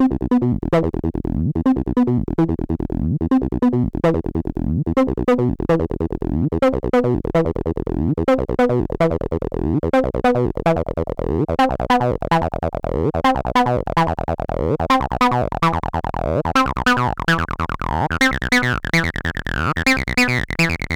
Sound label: 303 Acid